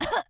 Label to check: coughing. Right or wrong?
Right